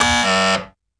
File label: kp fail